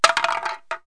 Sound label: Tin Can Falls on Wood Floor; Household; Cans & Containers